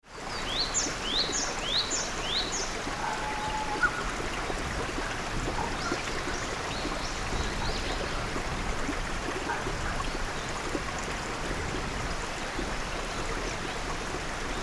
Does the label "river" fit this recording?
Yes